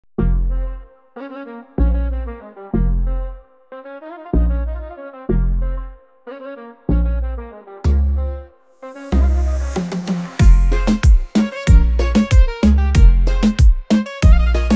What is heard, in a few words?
bass reggaeton; instrumentala cu bass